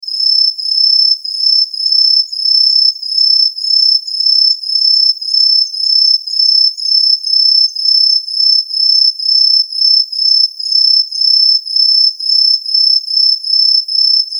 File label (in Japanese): アオマツムシ 長め